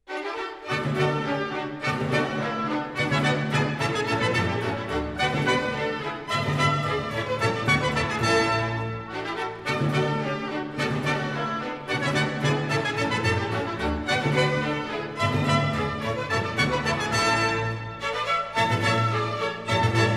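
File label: Orchestral Suite No. 3 In D Major, BWV 1068- IV Bourrée; Johann Sebastian Bach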